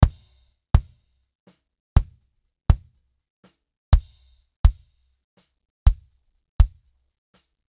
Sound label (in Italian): cassa; RME Fireface UCX